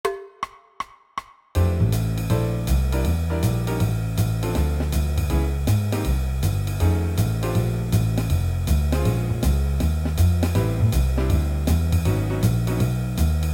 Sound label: Blues Forma